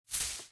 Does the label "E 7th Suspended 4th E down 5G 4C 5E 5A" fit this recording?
No